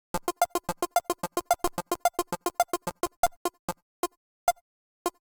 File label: DICE Electronic B, Roll; Samples; ArticulatedSounds; DICE Sfx Party (Extended)